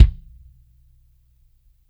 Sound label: DEEP DRY, BG KICKS; Spectrasonics; Burning Grooves